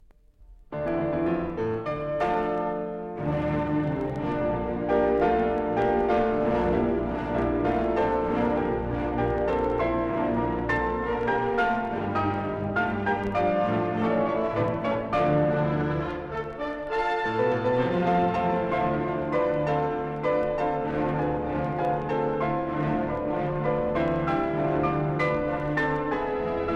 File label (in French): Brahms Concerto 2 piano mvt 2 extrait